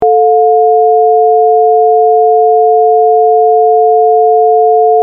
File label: sine test